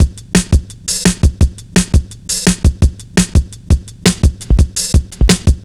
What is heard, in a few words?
motorboat, Drum Bass Drumloops 170bpm; Zero-G; Total Drum Bass